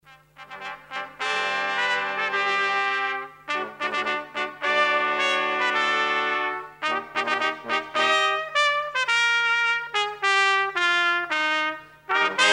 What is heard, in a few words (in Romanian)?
Grup instrumental